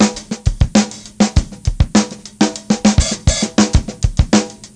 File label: funk